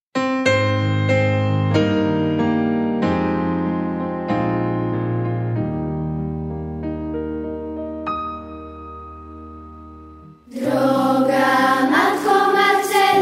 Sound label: Hymn